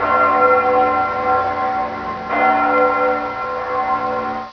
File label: bells; CLOCHES, COUCOUS…